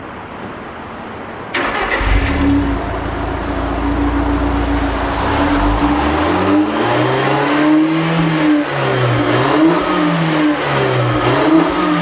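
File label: muffler blip; Giulietta; unicorse-italial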